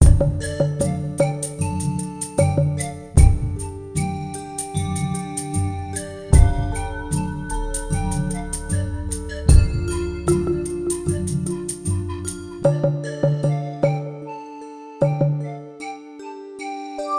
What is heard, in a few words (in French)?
musique; Mobiclic46; Demo Atlas